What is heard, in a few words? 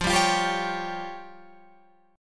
F Suspended 2nd Sharp 5th F down Guitar Open F; Fsus2#5 strummed; JGuitar